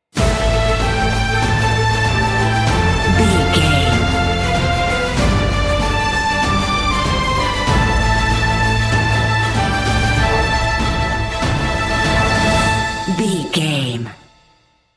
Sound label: epic cinematic